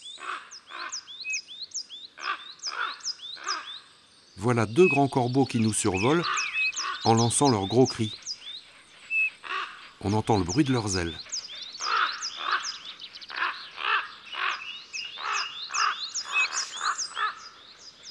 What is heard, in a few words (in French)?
Grand corbeau ABC des chants d'oiseaux